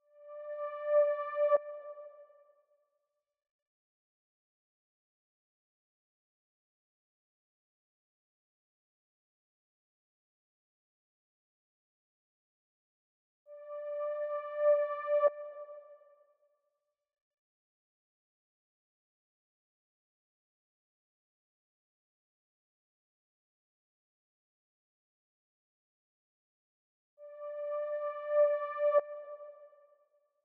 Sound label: Kit, Processed, 70 bpm, Reverse